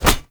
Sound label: Hoverboard Grab; Vehicles; Warframe; Venus Hoverboard